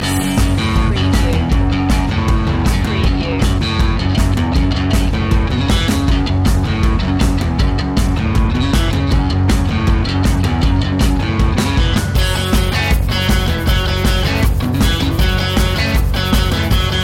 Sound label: dirty fun loop